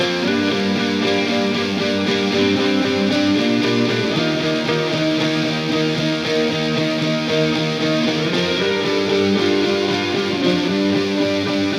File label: Rock